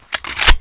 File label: usp clipin; ghost ws; weapons